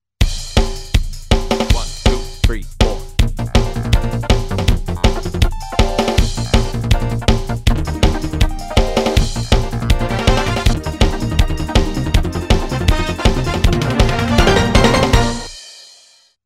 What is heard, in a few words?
Tong Test Bb, 160